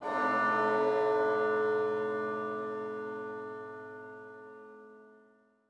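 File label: ambience bells; Blackfaun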